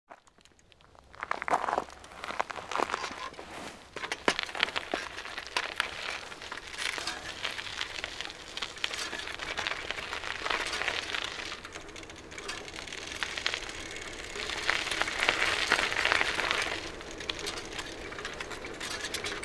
Bicyclette

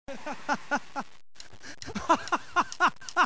Rire seul

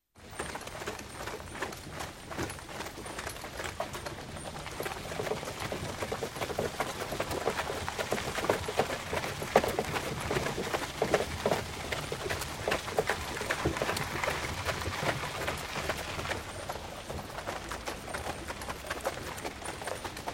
attelage; Ombres du passé

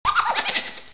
Rire cartoon; bruitage

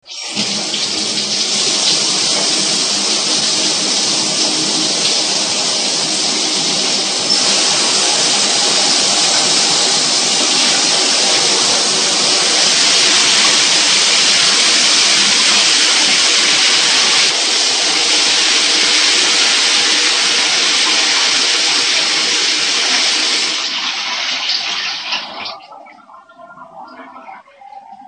Douche; bruitage; Liquides

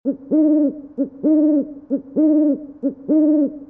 Chouette; bruitage

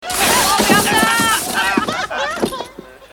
Chute, Ski

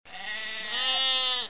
Mouton, Animaux; bruitage

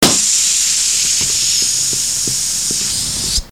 Crevaison pneu

